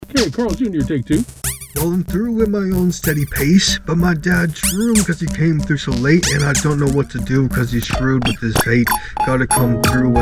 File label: rap